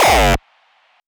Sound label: gabba kick